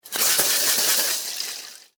Pesticide Can Shake, Extermination, Pesticide, Can, Shake, Insect, Bug; Sound Effects:Animals:Insects; Blastwave